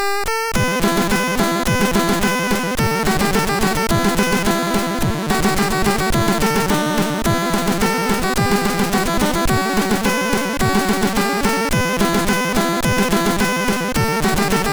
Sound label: Fatum Intro; MOS6581R3; Signor